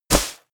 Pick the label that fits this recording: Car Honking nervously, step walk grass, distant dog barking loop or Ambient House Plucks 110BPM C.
step walk grass